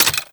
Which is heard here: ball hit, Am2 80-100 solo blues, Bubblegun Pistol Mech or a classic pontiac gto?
Bubblegun Pistol Mech